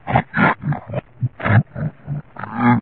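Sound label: zombie idle; svencoop; custom monsters; necroscasm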